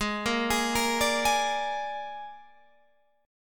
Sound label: Ab Suspended 2nd Flat 5th Ab Guitar Open G